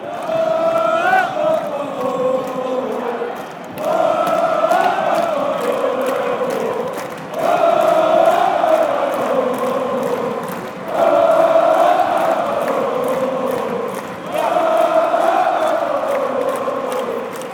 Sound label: oh oh oh, fanchants, sweden